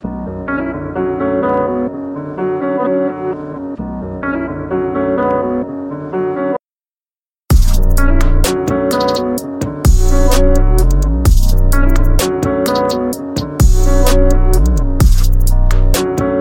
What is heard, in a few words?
Instrumental Rap Hip Hop Beats Hard Piano Trap Beat; Freestyle